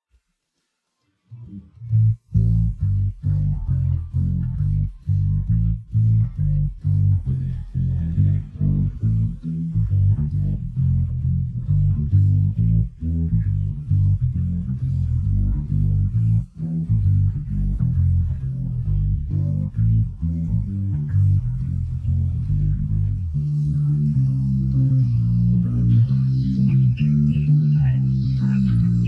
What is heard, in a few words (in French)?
extract basse; magma